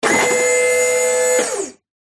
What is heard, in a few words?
vehicles truck ramp tail lift motor whirr